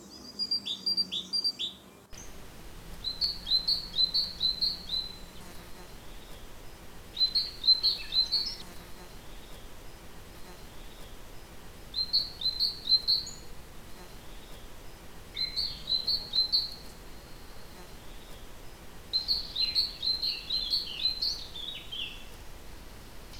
canto territoriale cinciallegra parus major great tit carbonero comun; bioacustica

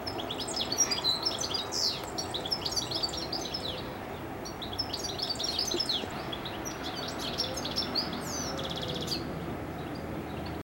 canto tipico cardellino carduelis goldfinch stieglitz jilguero; bioacustica

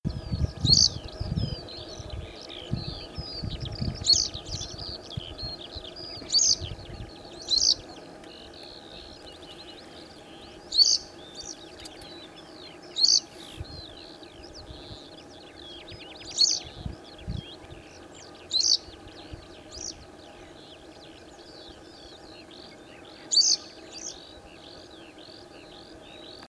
verso canto Cutrettola motacilla flava yellow wagtail lavandera boyera schafstelze bergero; bergeronnette; bioacustica

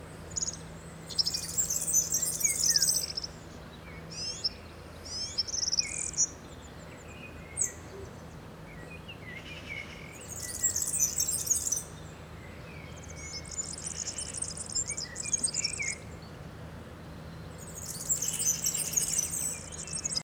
canto verso verzellino serinus european serin girlitz verdecillo serin cini; bioacustica

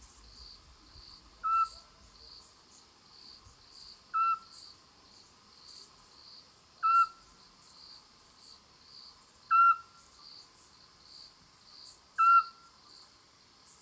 canto verso femmina assiolo otus scops owl petit duc-scops autillo europeo zwergohreule

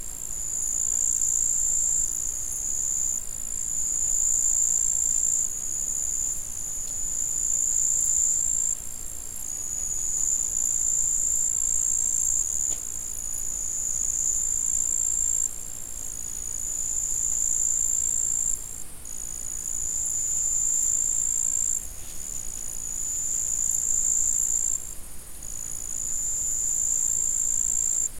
notte cicale; Soundscapes

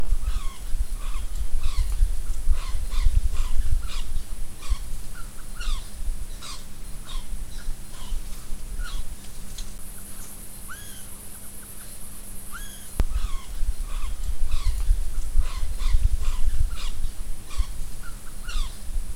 verso ghiro glis loir gris siebenschlafer liron fat dormohouse loir

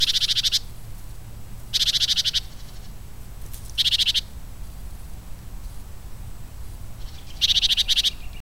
verso cinciallegra parus major great tit carbonero comun; bioacustica

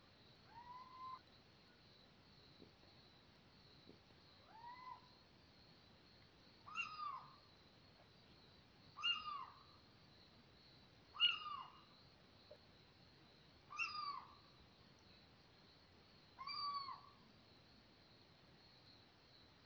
canto verso civetta comune athene noctua little owl steinkauz mochuelo europeo cheveche

